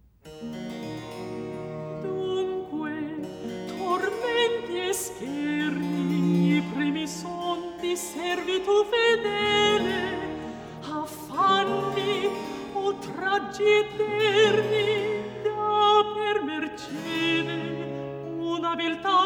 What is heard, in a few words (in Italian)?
Recitativo; Piango, sospiro, e peno; Dunque tormenti e scherni; Scarlatti; Cantatas